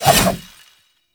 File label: Two Handed Nikana Spin Swing; Warframe; Duviri; Dax; Weapons